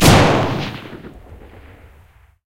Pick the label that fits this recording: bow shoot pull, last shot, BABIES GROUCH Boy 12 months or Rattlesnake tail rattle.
last shot